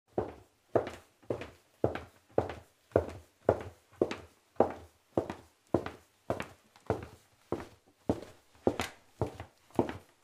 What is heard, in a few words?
footsteps